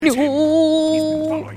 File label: thief oooo